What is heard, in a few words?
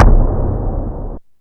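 DRUMTRAKS low tom, Drums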